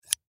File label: Scissors Close; Office; Blastwave